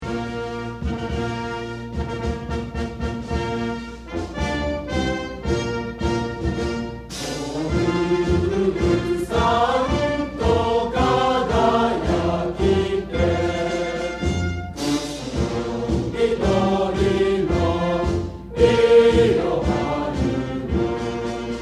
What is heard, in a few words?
new cheer song